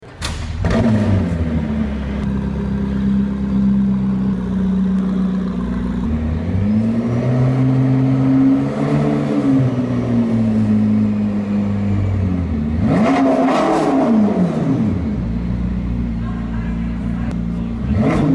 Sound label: McLaren P1